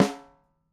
Open Ring, Dimensional Snare; Airwindows

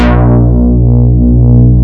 MINIMOOG; OLD SYNTHS; Rubber Chicken Software Sample Bank

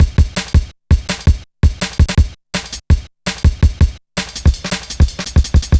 Cymbal Rise, Drum Loops 165.5; Zero-G Jungle Warfare 1, 2 & 3

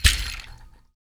HAND, Afro Shakers, Ankle Pods

